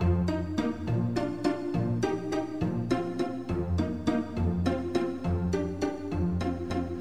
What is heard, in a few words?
keys, jazz loops